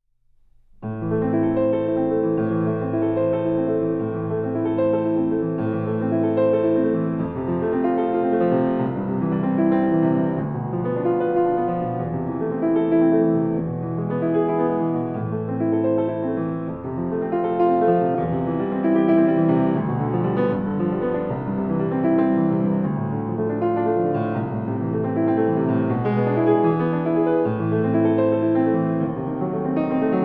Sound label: Piano Only; ABRSM; Bedrich Smetana; Ma Vlast; Vltava